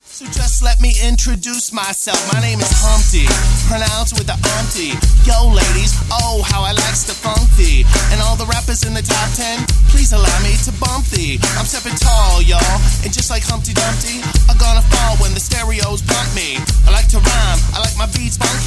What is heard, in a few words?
Live Band